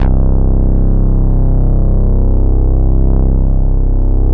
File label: ARP BASS; AKAI S6000 CD-ROM - Ultimate